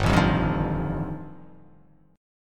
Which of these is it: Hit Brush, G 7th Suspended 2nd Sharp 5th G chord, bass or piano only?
G 7th Suspended 2nd Sharp 5th G chord